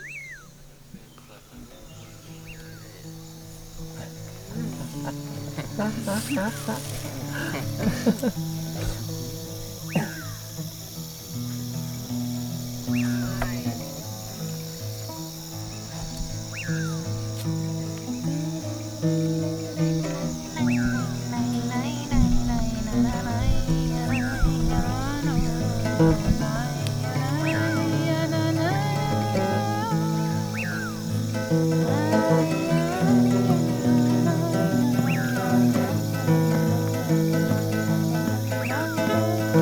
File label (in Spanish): Violin y Guitara; mexico 2005